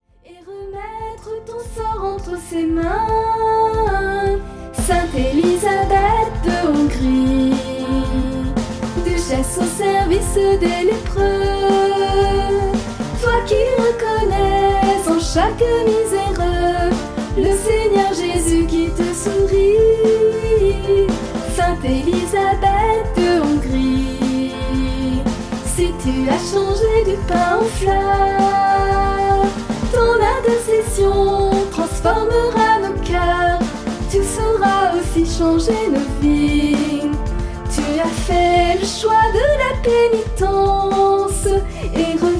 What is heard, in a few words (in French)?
sainte elisabeth de hongrie soprano mono